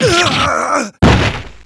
super damage

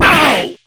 damage; hazama

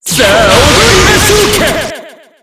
overdrive; Hazama

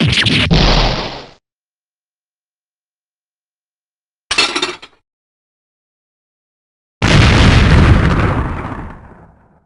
bowl foiled

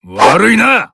attack; jubei

